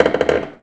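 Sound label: boat creak; The Elder Scrolls III - Morrowind; Morrowind GOTY GOG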